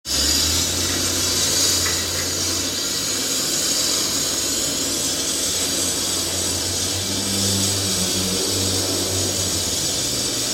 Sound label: Disc cutter